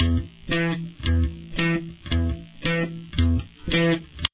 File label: intro jam, guitar